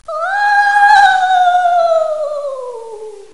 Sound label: Ghost Shriek Halloween Sounds Car Horn